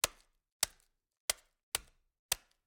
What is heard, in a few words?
gore punch; sonniss gdc